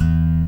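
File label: soft finger, Guitar; 90 s Sample CDs; Quantum Leap - Guitar & Bass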